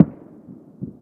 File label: firework single; best service Blue Box